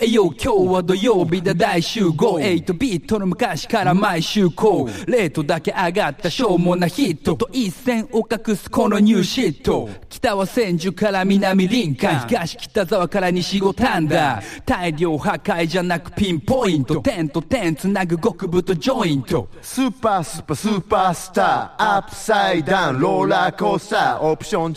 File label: REMIX ACAPELLA